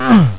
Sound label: fall; alienarena; doomunls